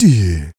Voice Snare; Roland BK-5

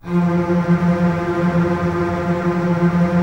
F 3, Basses Tremolo, String Ensembles; Miroslav Vitous; 90 s Sample CDs

